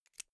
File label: insert xlr extension latch hard heavy; objekte; elektronik